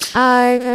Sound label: singing